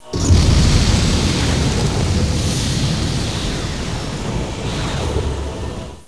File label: explode